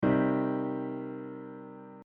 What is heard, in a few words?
C Minor Root Position, Triads; Triads and Pseudo-Triads